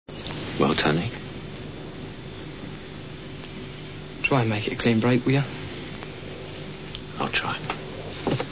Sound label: clean break